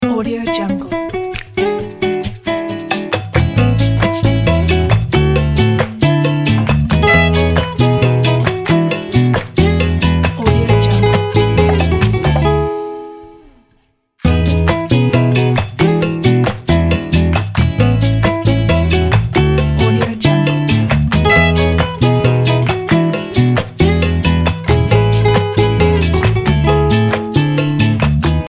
happy guitar intro; winniethemoog

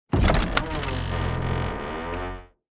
door medieval open

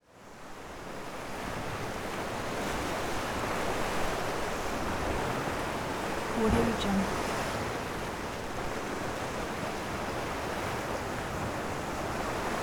waves crashing on rocks

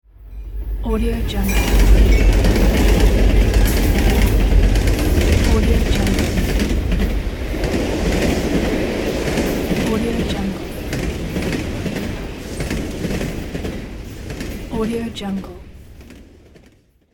train

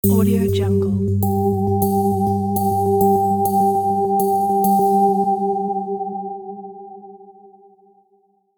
astral meditation bells

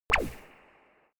sci-fi space jumping shoes

